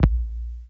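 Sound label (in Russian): кнопка сухая одиночный шумный приглушенный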